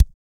BEATERBOY; Spectrasonics Vocal Planet

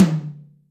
Room Hi, Acoustic Tom

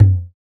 Tablas; Spectrasonics; Supreme Beats; World Dance

